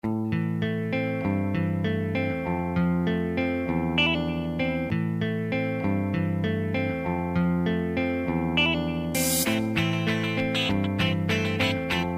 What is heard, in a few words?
instrumental